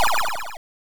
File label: COIN; Mat Mania; arcade